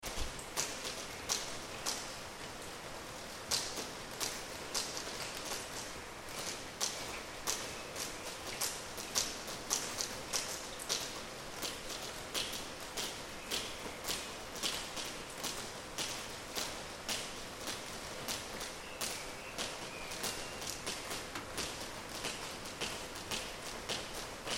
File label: night rain banging in the patio prague